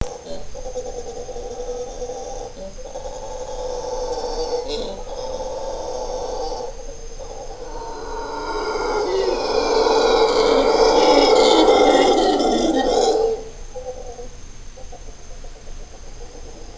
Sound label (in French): singe hurleur